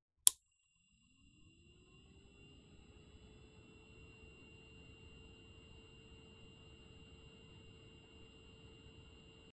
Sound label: New LED fan sound